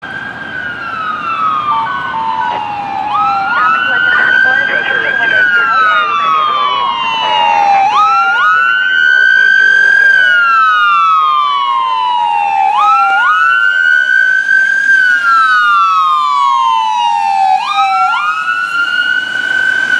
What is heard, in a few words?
Station Ringtone, Fire Station Sound; Station 51